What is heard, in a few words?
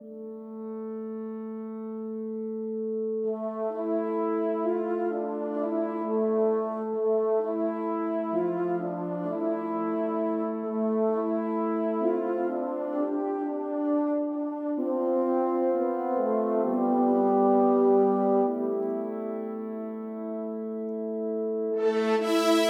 Music Rush Brass; 7 Mages